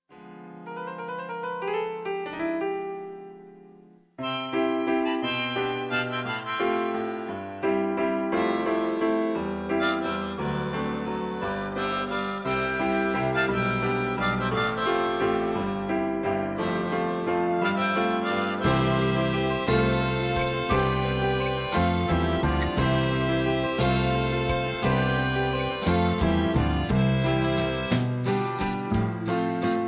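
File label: Karaoke Track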